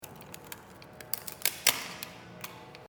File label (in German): Flexscheibe auswechseln